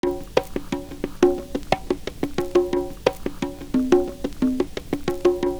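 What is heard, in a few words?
percussion, loop; Univers Sons - Kitch 70s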